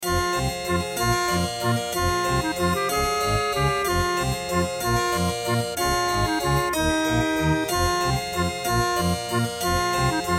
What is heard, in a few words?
Miditemp DoX-1; Death Gate